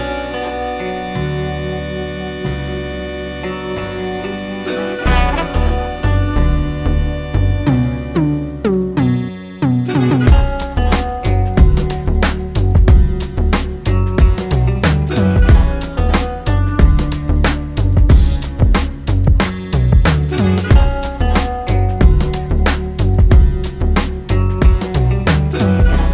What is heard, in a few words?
Delirium Electronic Ringtone